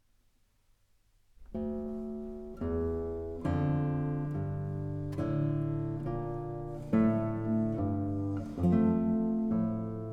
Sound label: Chorales, Guitar; Leipziger Chorale Nun komm der Heiden Heiland, BWV 659; Bach Transcriptions and Arrangements for Guitar